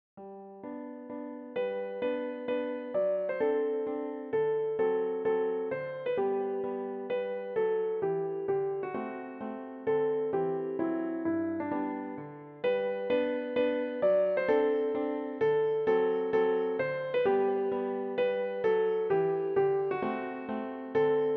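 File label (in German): La Donna É Mobile, Klavier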